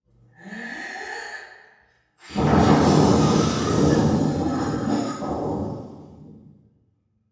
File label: Hard Blowing